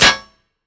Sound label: bullet metal solid; physics